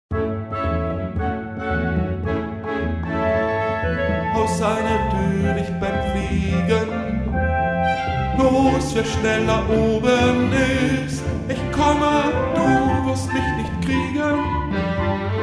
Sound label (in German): Orchester